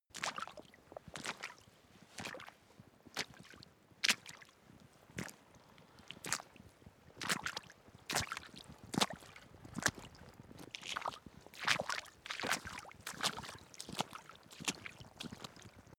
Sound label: step water walk